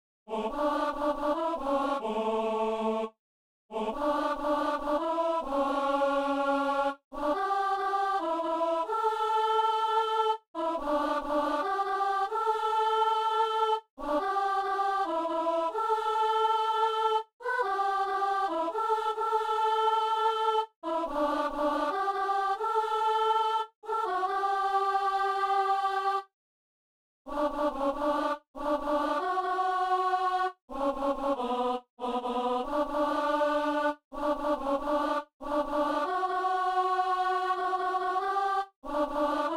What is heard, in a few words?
alto